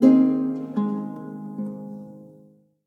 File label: Guitar Chord, Classical Harmonies notifications; Google Pixel 4